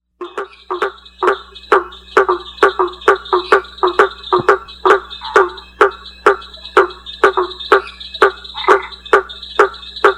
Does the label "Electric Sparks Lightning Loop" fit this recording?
No